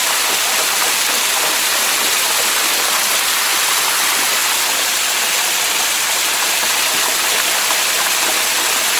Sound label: water hose; OpenValley